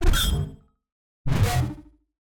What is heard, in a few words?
digital magic corrupt interface; sonniss gdc